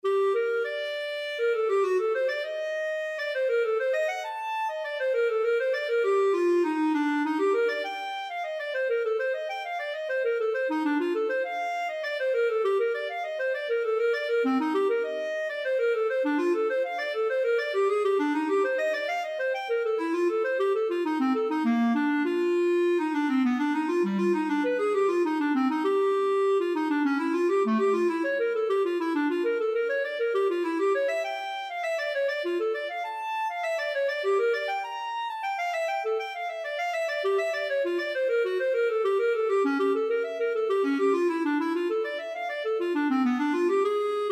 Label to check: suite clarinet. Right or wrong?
Right